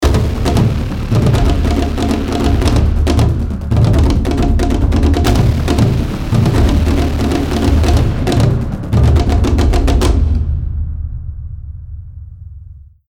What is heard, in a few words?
Hunting Transition